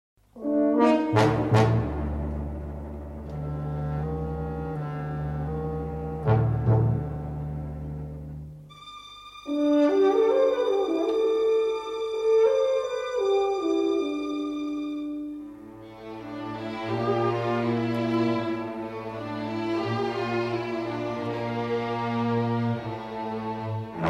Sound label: Active Suspense